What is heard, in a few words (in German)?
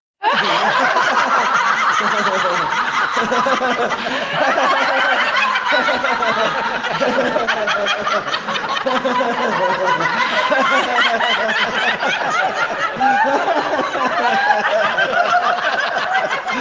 Lachyoga